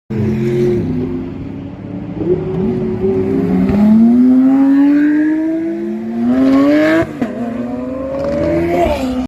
Lamborghini Aventador

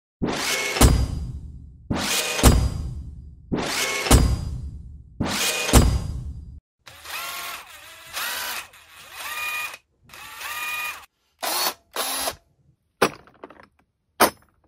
production Tool

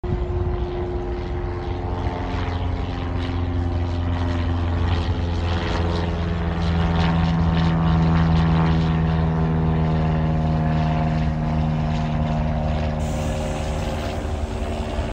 Cessna plane; هواپیما سسنا

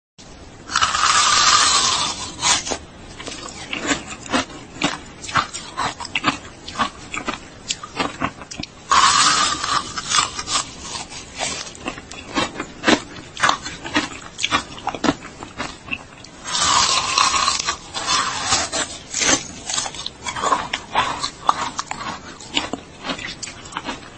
Eating Freezie; چالش خوردن یخمک

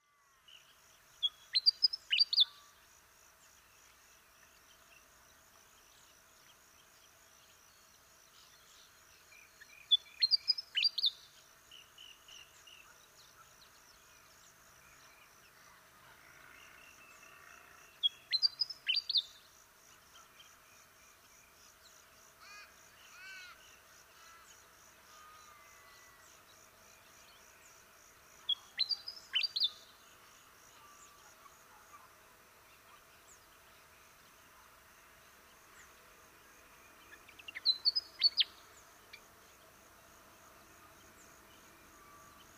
Willie wagtail, پرنده دم چتری ویلی

